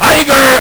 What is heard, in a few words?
LSDj, Nintendo Gameboy; 300 Drum Machines